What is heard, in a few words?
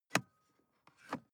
sports car int switch press and release